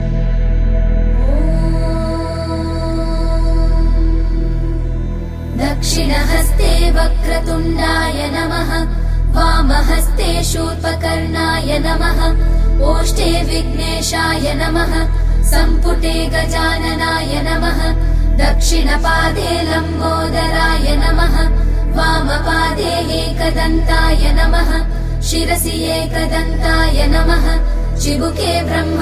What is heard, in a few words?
devotional